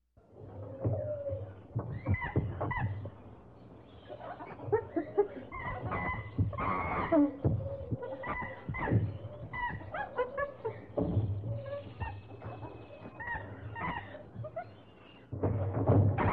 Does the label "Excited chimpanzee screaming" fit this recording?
Yes